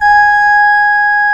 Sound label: FLUTE G#5; AKAI S-Series CD-ROM Sound Library VOL-2